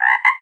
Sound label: frog; animalia